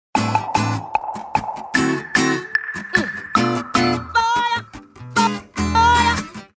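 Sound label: beautiful guitar riff, ringtone cut from a song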